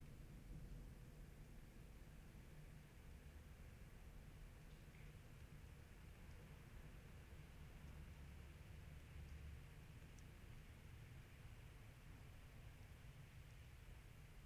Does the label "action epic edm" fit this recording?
No